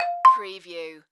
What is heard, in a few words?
Multimedia Alert